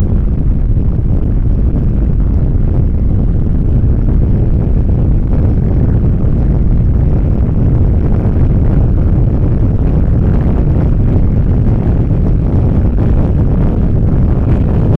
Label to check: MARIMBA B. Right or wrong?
Wrong